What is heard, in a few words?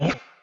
spirit death; FlyForInsanity